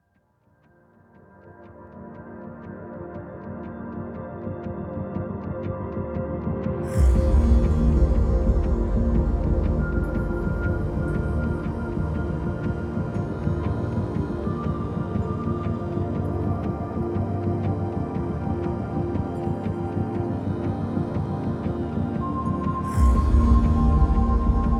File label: drone loop